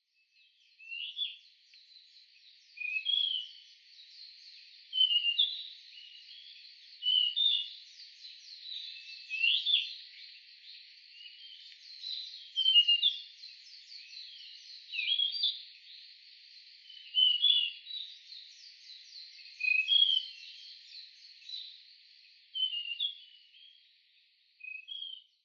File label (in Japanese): rkibitaki; ishigaki